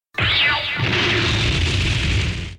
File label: laster explosion; evangelion